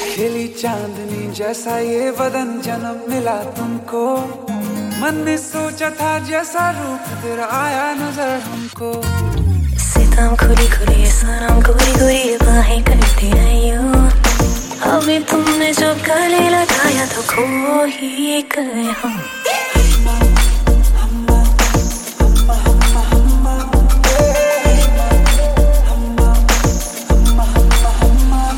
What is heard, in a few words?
Ringtone